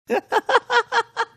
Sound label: awesome laugh